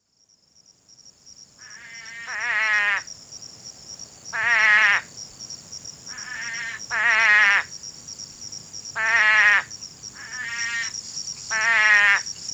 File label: Scaphiopus couchii